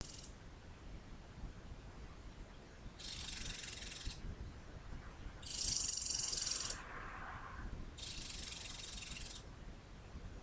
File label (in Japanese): himegisu